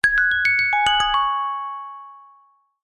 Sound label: multimedia alert chime short musical notification; zapsplat